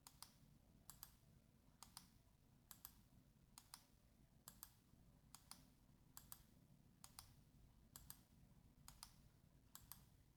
g502 x plus side button sound; logicool

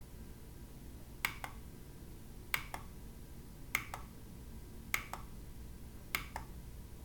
egret prettie mouse middle click sound

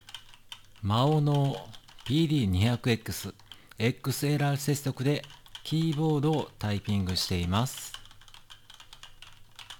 maono pd200xs xlr typing

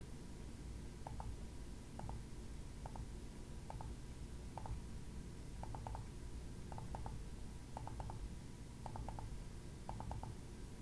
egret prettie mouse left click sound

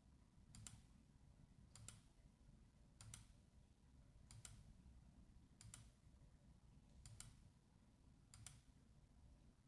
logicool g502 x plus left click sound

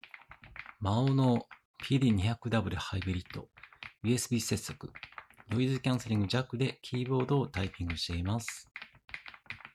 maono pd200w hybrid usb typing sound

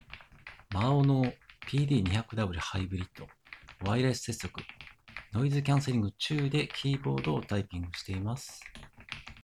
maono pd200w hybrid Wireless typing sound

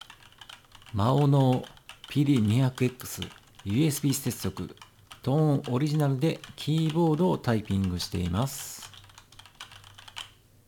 maono pd200xs original typing

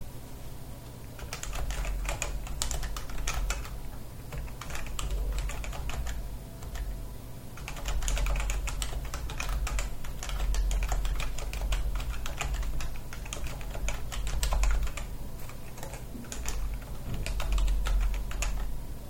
realforce typing sound

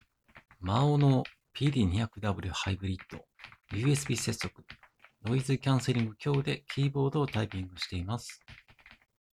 maono pd200w hybrid usb typing sound

